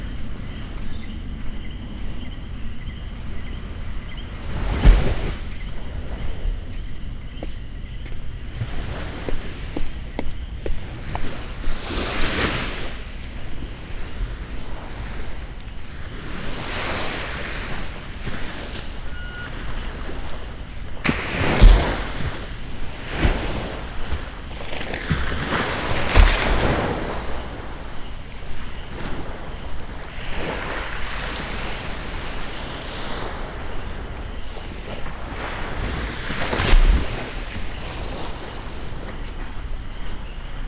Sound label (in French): plage vagues insect